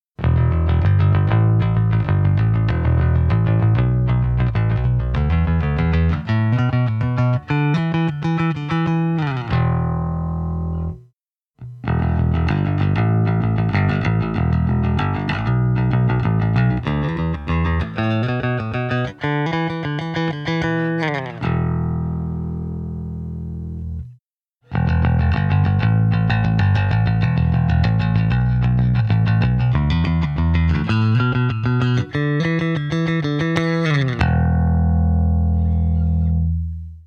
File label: jbf-1000 pick; Modern Jazz Bass